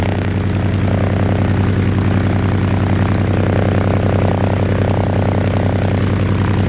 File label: R-1535 Slow; fgdata